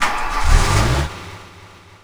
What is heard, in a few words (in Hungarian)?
autoinditas; The Wild Thornberrys Movie